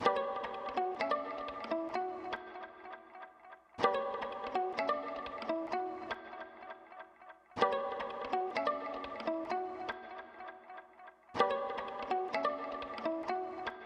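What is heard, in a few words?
127 BPM B Min Pizzicato Violin; Trap Melody Loops; Loop Stems; Cymatics; Spirits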